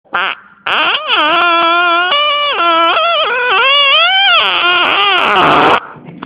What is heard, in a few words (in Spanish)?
Instrumento de viento